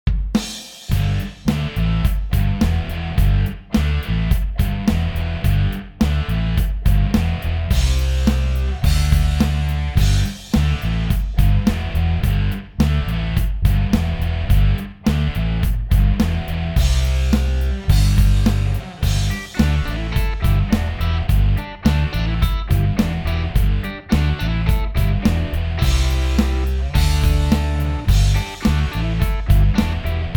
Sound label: Riff